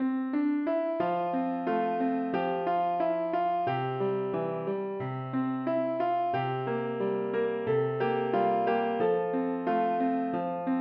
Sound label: piano